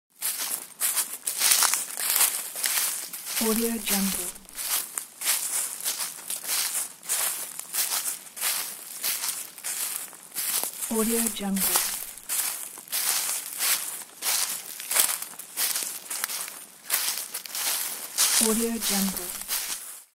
walking on the leaves; pure-noise

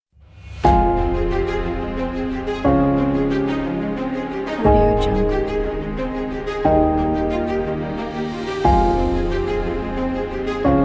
epic personal growth

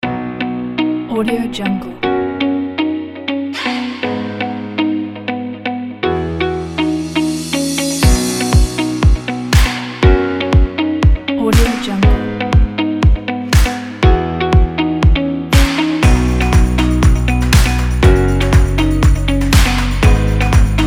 the upbeat corporate kit

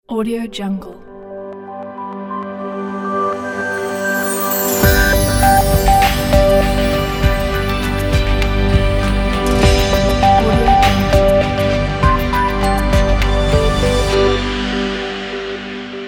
ethereal glitch logo

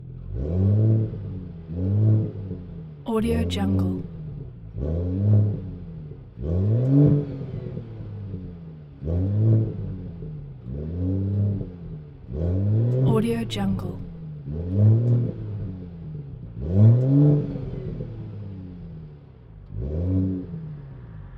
car idling mixture exhaust

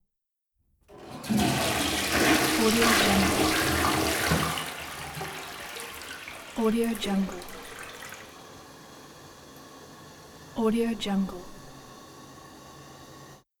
toilet flush

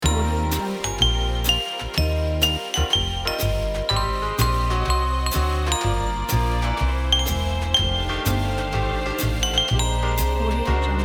jazzy vibes christmas logo

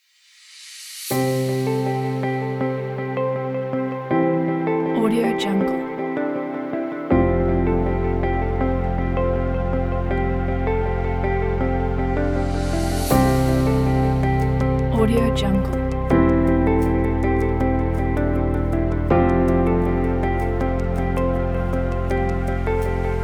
atmospheric logo

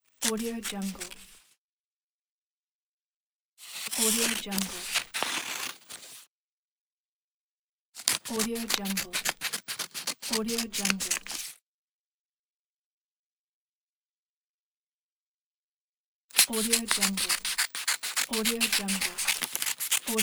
scissor

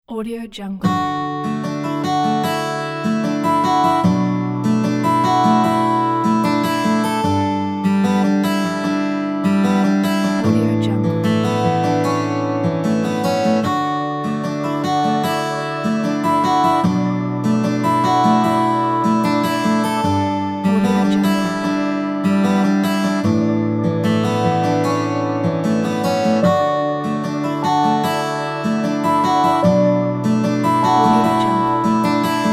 acoustic emotional

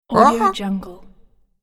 comedy bird voice

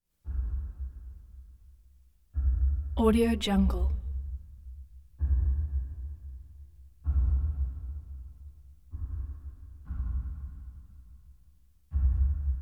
metal thumps single hits

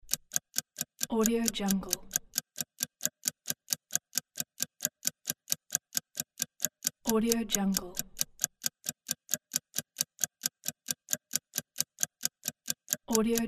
clock ticking; ssalt

